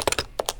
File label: UI Comment Type